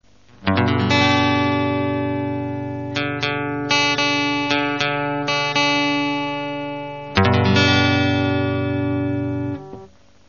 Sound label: tuning tips g